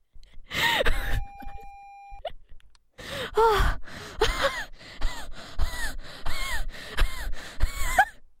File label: wheezing